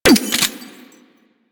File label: Shape Blast Shotgun Mech; Warframe; Weapons; Corpus